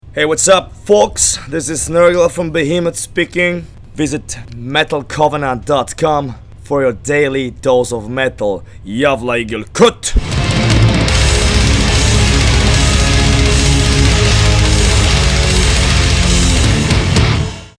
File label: jingle